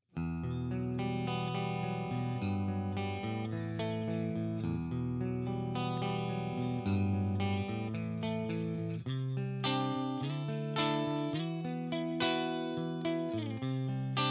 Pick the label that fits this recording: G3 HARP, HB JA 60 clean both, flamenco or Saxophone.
HB JA 60 clean both